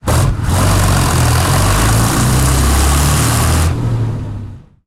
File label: su 100 engine load, tanks; War Thunder